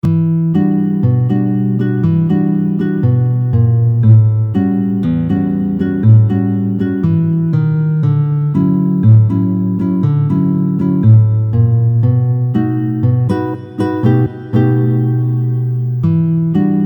bossa, guitar; teiban

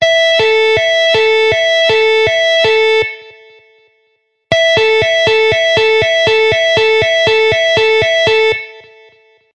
tapping sound, guitar

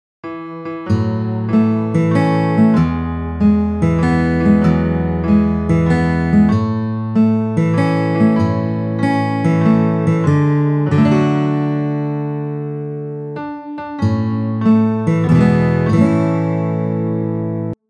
happy birthday, guitar

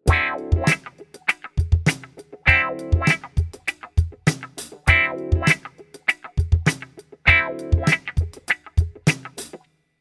wah, guitar

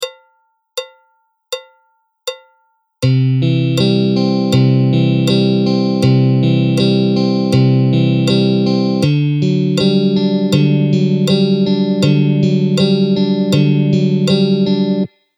guitar arpegio phrase

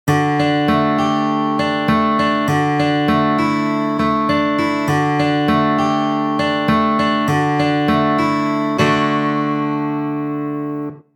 finger-picking, guitar

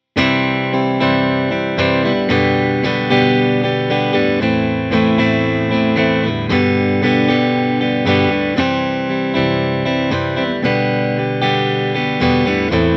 pic thin, guitar

